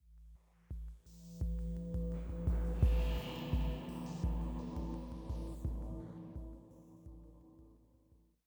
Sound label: Military High 85bpm